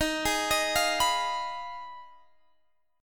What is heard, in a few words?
F Minor 7th Flat 5th Eb, guitar chord